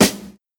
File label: reverb snare one shot g key